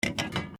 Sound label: Mechanism